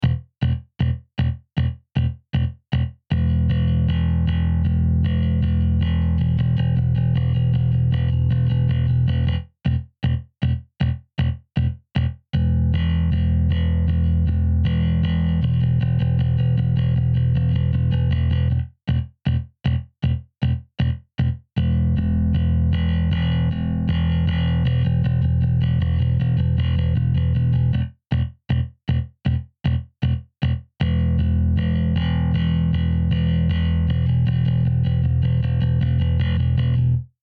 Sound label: Bass; ModoBass OmniChannel